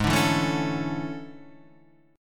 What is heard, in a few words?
E 13th G sharp